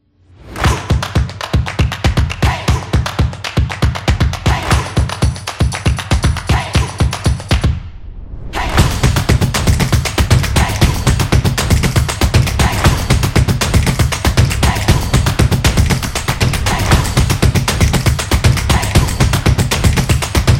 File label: Drums Upbeat Energetic